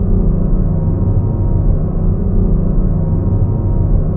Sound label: blue beam; borg